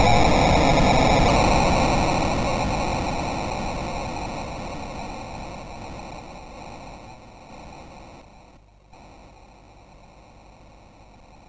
MOS6581

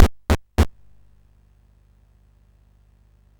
Dragonworld sid CSG8580R5

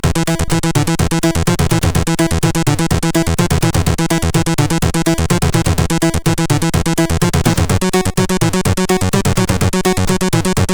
Jingles, CSG8580R5 sid

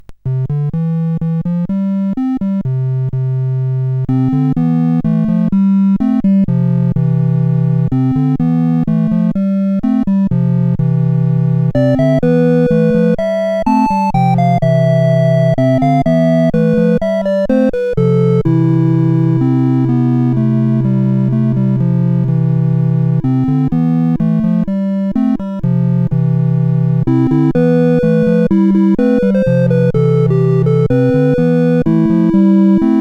Oldskool Song, sid CSG8580R5

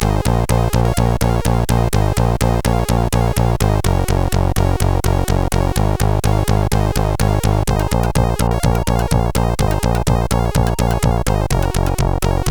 CSG 8580 R5

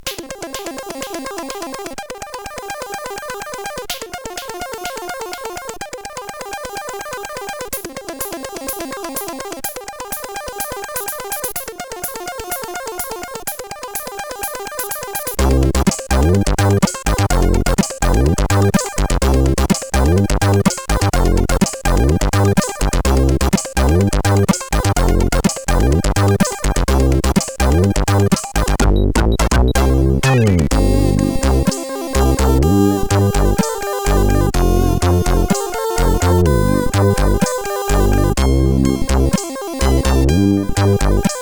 Simple Melody, CSG8580R5; hvsc

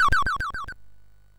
Shuffle, sid MOS6581R3

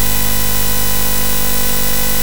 Space Gun

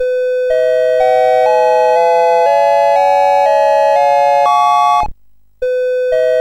sid CSG8580R5, GAMES; Draughts BASIC

